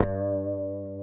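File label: G 2A, compressed strat, guitars; Spectrasonics; Hans Zimmer Guitars VOL-1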